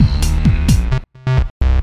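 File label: synth bass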